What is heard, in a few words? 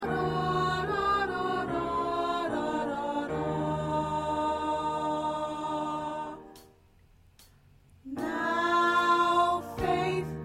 Soprano